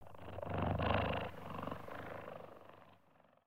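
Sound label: Sabertooth Eating; creatures; pets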